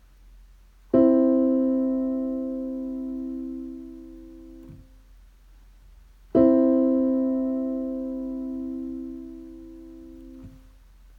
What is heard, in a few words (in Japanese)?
和音 ド ミ